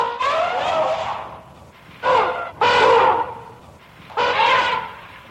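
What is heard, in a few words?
elephant makes different sounds with its trunk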